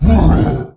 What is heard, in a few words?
Dread Lord Wound Crit